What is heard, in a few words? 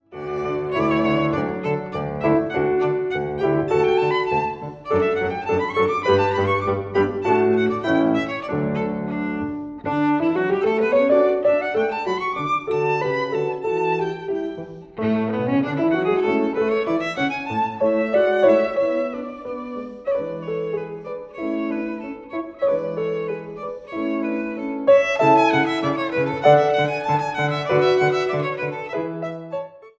VIOLIN; Trinity College London violin exams for 2016-2019; J C Bach: 3rd movt from Symphony no. 4